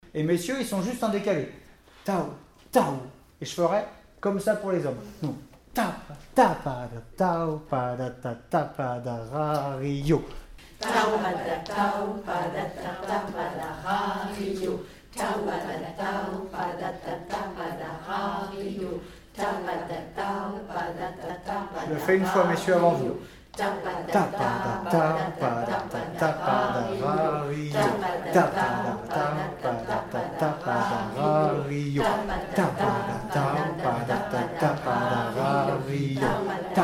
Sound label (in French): Bibihotz Hommes; Mesures 17-20